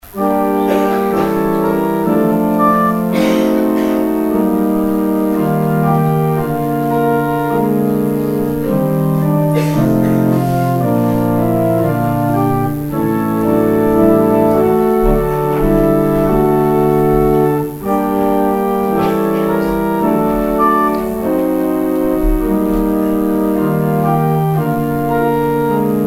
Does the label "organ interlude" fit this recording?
Yes